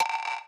DRUM SHOT HOUSE 125; eJay; Singleshots

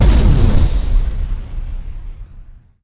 Blast; ImpGal2; groundwar

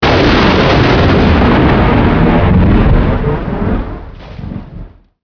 lightning; PC Gamer IT CD 41; Daikatana

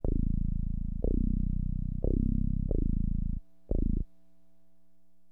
BASS, HipHop; LiveAct Pool 1

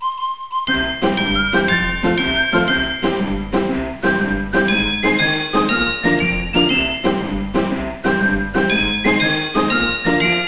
kids

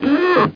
rub; dogz

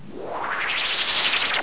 freeze

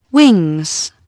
wings; Dorling Kindersley My First Incredible Amazing Dictionary; FLY

